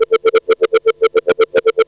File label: IMPULS; JUST FOR FX